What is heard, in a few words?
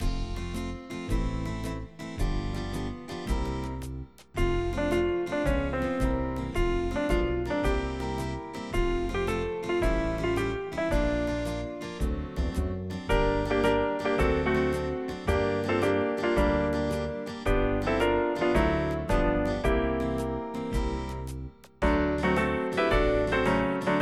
PIANO key of F; short version